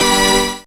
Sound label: synth g, Rave Synths; Dance Synths